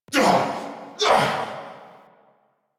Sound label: Pain Grunt; en; Warframe; Nightwave; Season Three Glassmaker; Nihil Fight